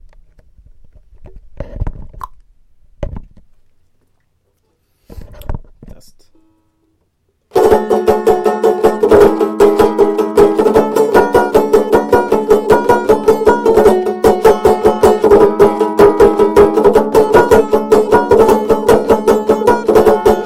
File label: strummin, banjo